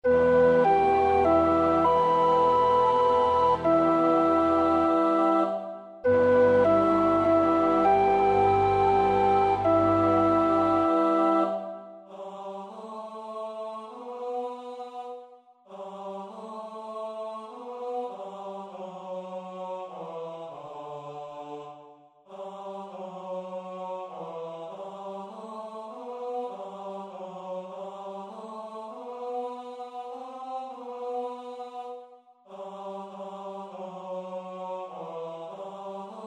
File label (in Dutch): alt, koorpartij oefening; Pärt (Arvo); Berliner Messe